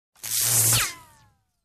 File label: Electrical discharge